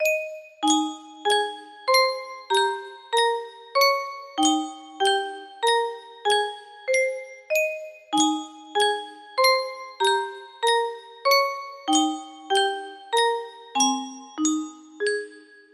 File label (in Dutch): Yunsheng muziekdoosje